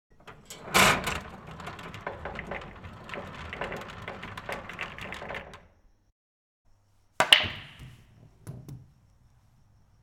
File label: Pool 8 Ball Sounds